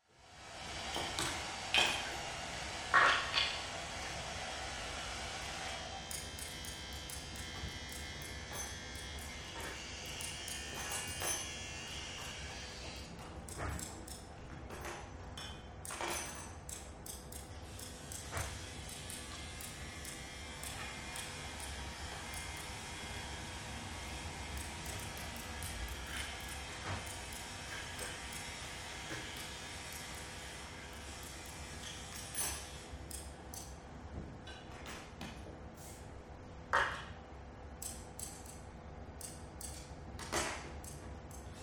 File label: Hairdressers Midday No Voices; noise creations